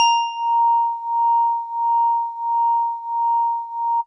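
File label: House Organ DX100 A#0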